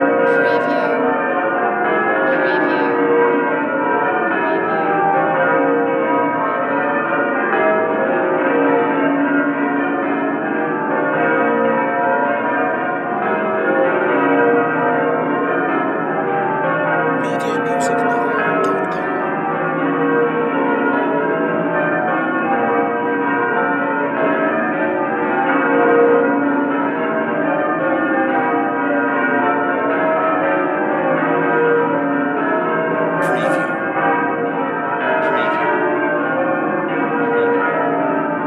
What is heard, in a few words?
Church Bells St Pauls Cathedral